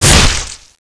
ghoul hit, claw; cstrike

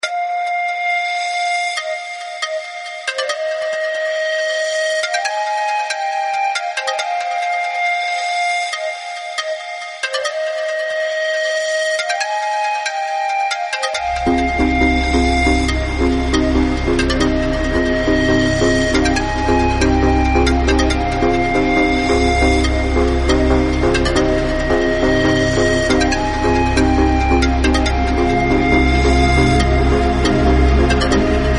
panflute

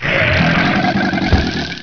gator die simple; gearbox

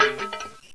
metal; svencoop; aomsounds

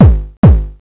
beat, party

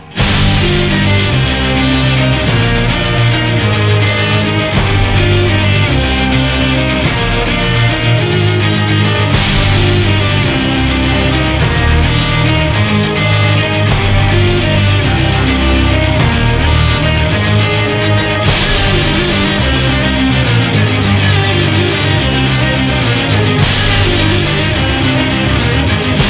music

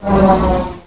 ywing flyby

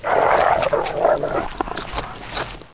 pit drone eat; svencoop

